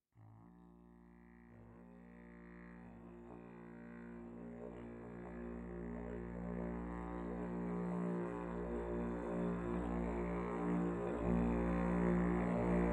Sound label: dolphin didj